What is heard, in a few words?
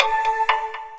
alarm; MBHaxe